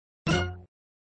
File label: Sound rollover not lit, flipper; INDY